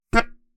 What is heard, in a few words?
Melodica Short Note